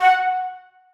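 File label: flutes staccato f#4; Sonatina Symphonic Orchestra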